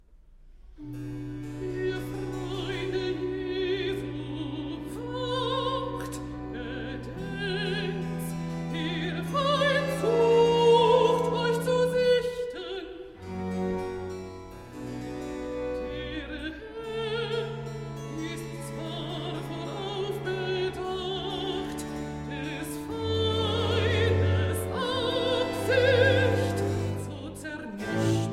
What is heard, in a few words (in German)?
Ihr Freunde Jesu, wacht (Alto)